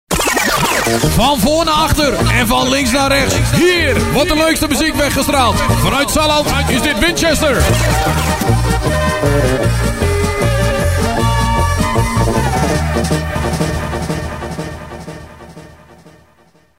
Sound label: Jingle; Audio Fragmenten; Arkan Radio 2